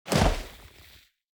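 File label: Crash Creature Landing Dustcloud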